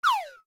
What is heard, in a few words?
whistle fall right; lego